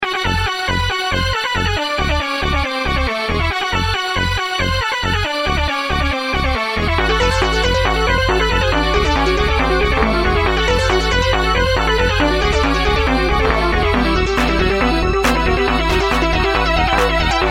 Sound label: instrumental